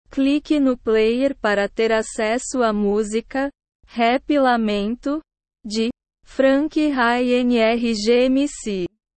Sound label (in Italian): rap lamento